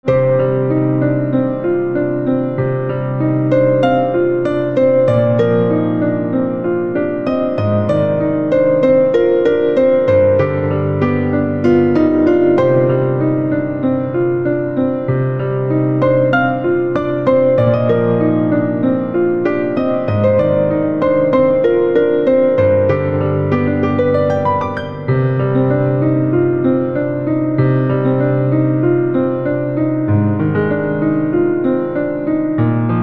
Piano

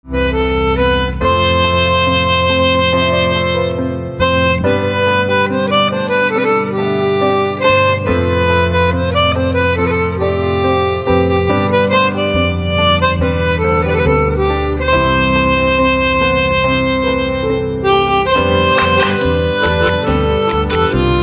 Harmonica Instrumental Ringtone

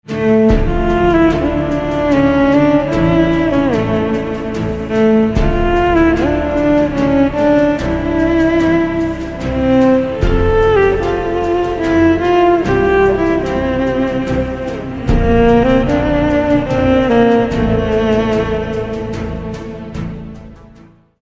Violin, instrumental ringtones

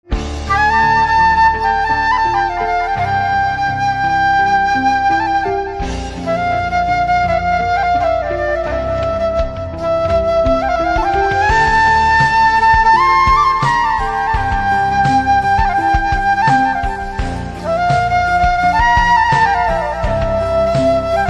Flute, instrumental ringtones

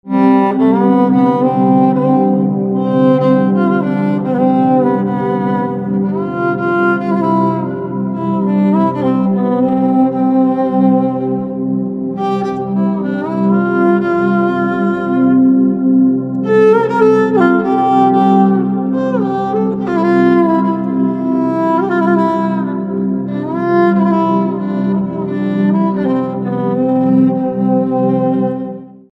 Violin Silent

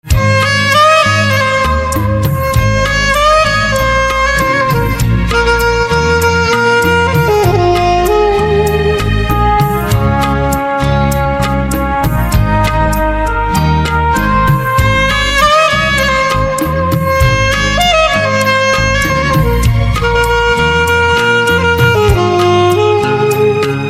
Saxophone

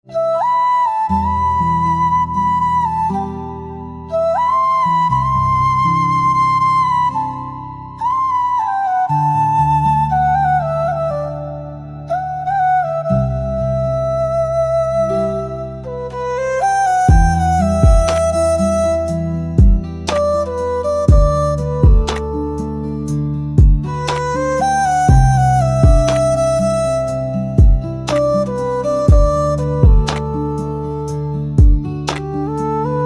Flute, instrumental

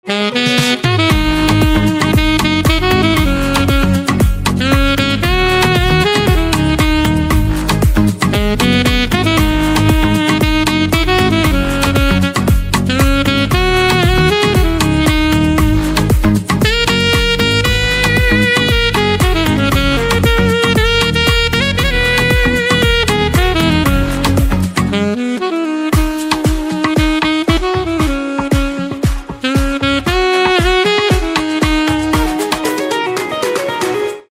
Saxophone

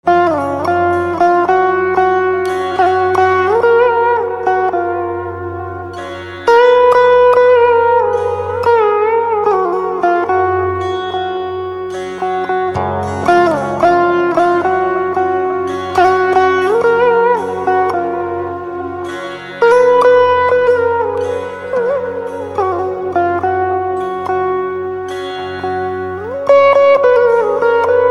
Veena

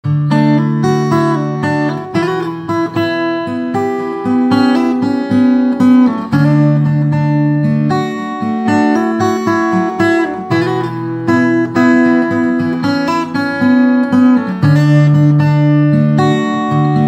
Guitar

